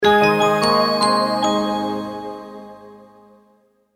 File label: multimedia logo, Musical Identity/Signature